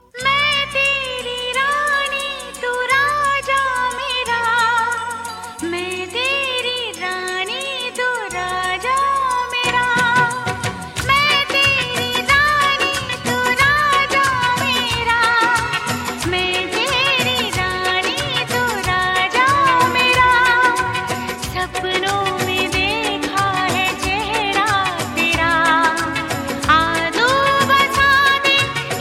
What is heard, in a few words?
Nice Love Tone